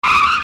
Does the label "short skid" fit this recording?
Yes